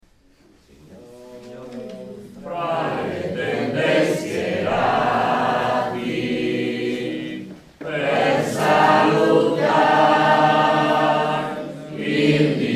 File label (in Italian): canto del tramonto signor tra le tende schierati